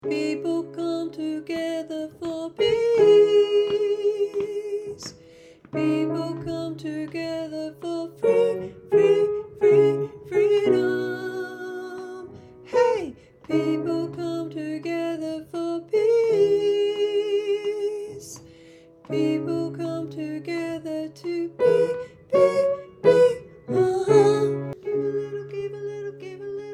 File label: soprano, voices